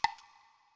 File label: Switch to Clockwork Kazooie Eggs; Banjo-Tooie